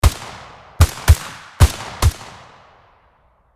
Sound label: HK416 assault rifle multiple shots